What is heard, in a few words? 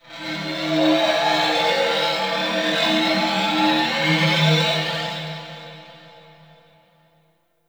Crp Ship Energy Moan Texture Med, Corpus Ship Ambience